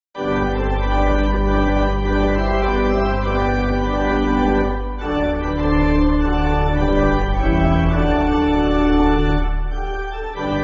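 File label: Pipe, Pipe Organ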